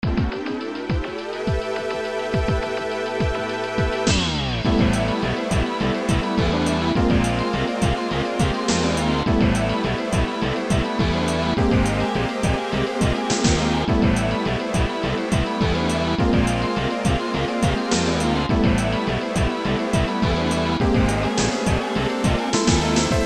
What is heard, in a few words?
SNES; Street Fighter EX2 Plus; Phantom Bomb (Airport Stage)